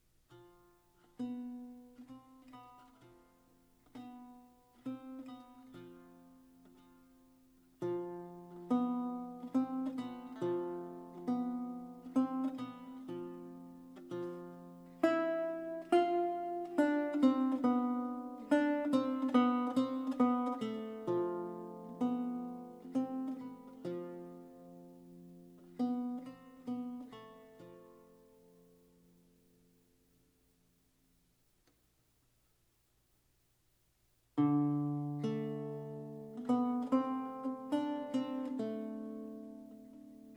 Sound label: two melodies